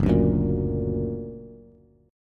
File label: Gb Augmented Bass; Gb+ strummed